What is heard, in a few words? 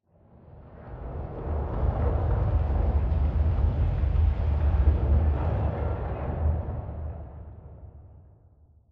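Explosion Rumble Exterior Distant Tail; Boomtown; Titanfall 2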